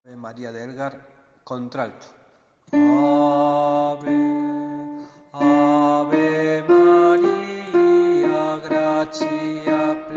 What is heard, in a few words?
avemaria alt; elgar